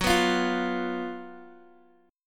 F sharp Major 7th Suspended 4th F sharp, Guitar Open D; chordshape; down